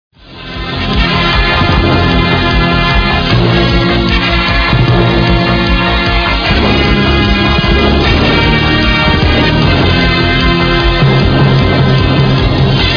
出场音效, 配乐类音效; 赌神

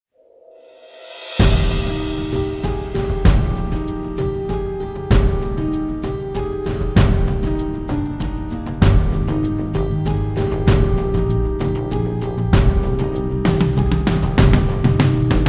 企宣常用, 企业宣传片常用宝藏背景音乐

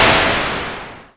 风

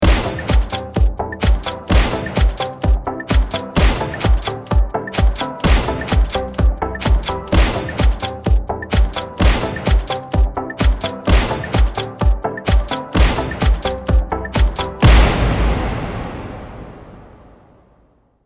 卡点快闪 枪声 98k 节奏短配乐

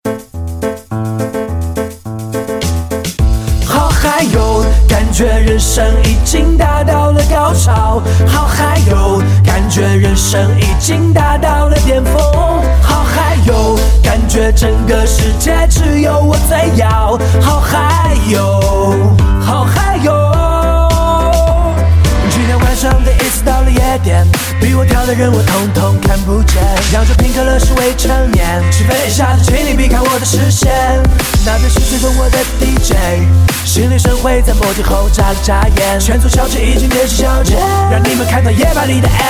disco, 抖音精选背景音乐

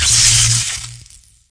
电流 短暂

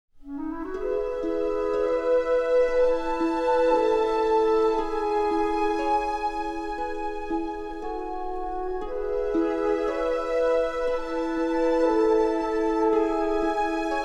抒情纪实, 宝藏背景音乐

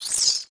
从左至右闪

